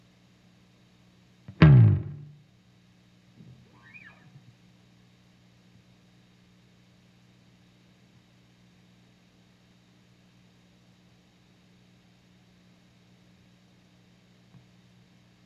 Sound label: bass slide